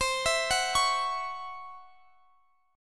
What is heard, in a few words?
Db Minor Major 7th Double Flat 5th C